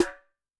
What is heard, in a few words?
JNGL SN, Snare; Yamaha DTXpress-II